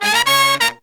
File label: Pop & Funk Brass